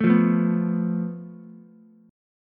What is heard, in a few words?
A Suspended 2nd Sharp 5th, Ukulele, Asus2#5 chord; strummed; JGuitar